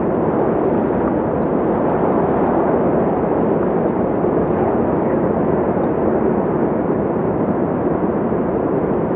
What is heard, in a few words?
Wind Loop; kult